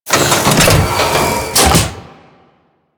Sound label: Biped Pod Spawner Open; Warframe; Corpus